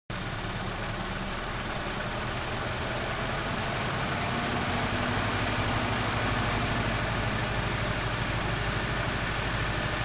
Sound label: Mid Size Car Idle Exterior; Vehicles; Manhattan Production Music